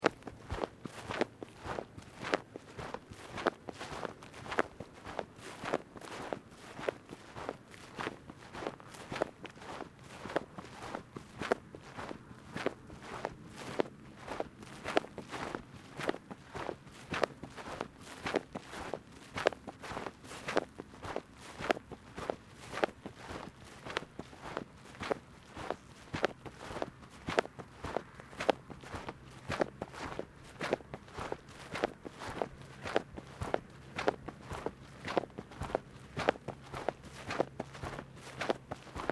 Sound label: Footsteps Boots Grass Loose Shoelace Sequence; Footstep Foley; Human